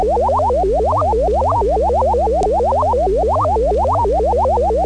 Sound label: space sound, electronic sounds